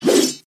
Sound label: ultimate attack impact